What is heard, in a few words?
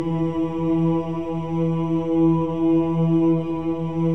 CHOIR OOS, E3; Spectrasonics; Symphony of Voices